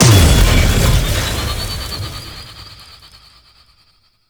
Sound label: plunge ability shockwave; Enemies; Abilities; Warframe; Duviri; Dax Knight